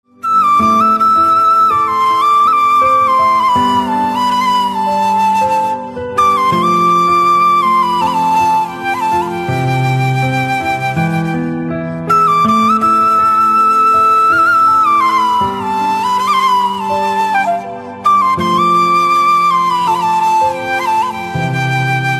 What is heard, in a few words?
Flute Instrumental Ringtone